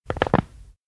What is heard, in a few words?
neck shoulder crack; ken; anemoi